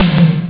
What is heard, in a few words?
super tom drum; ST-XX Amiga Soundtracker Sample Packs